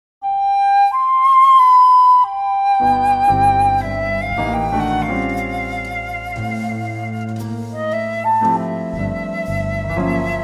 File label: Ballad